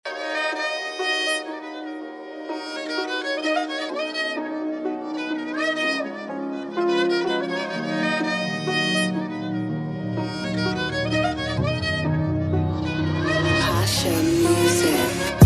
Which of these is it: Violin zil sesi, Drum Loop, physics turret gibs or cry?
Violin zil sesi